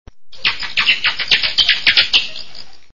Bats

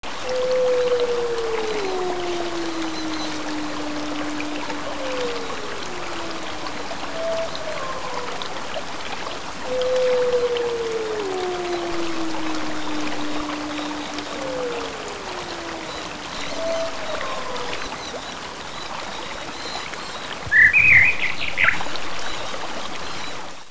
Evening Stream

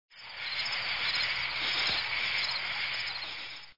Crickets

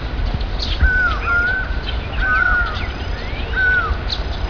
Morning Field

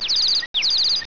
Bird

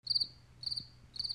Crickets

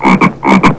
Frog

